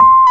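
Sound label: piano C5 p 73, Rhodes suitcase; Keyboards of The 60's and 70's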